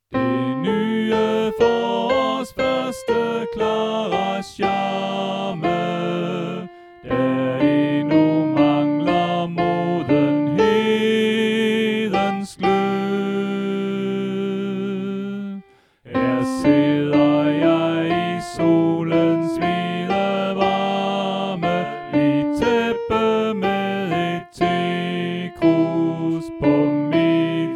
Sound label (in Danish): Sopran; oevefiler